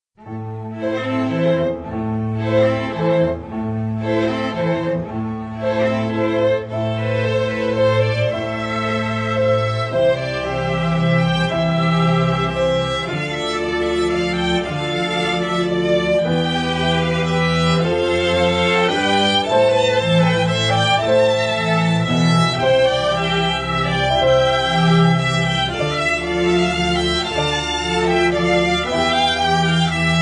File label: Waltz